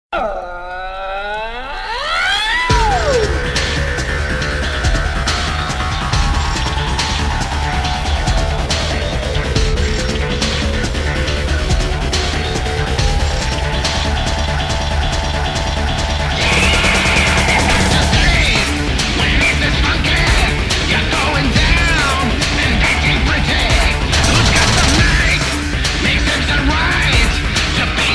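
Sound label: Hindi song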